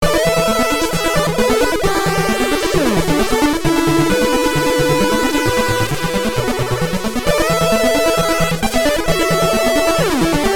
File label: Chiptune 53Hz; Razor 1911